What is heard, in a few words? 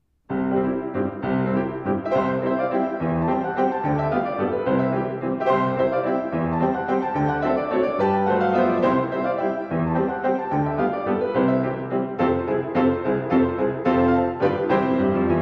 spanish dances allegro brioso; Moskowski